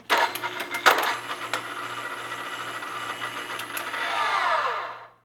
repair; milebymile

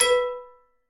plus; rollingballs

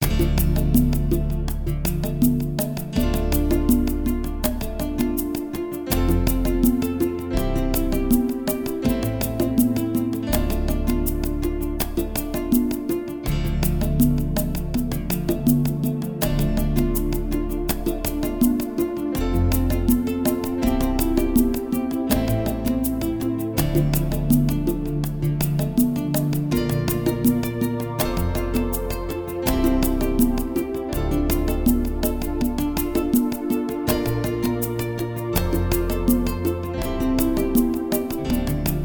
mus

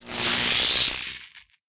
electric shock; battle

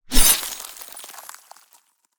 icicle knife; battle

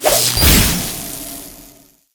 electrified sword, battle

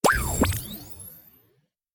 challenge success; coup

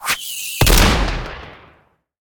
disruptor grenade; battle

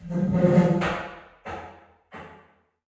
person leave; crazyeights

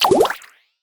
initiative; battle

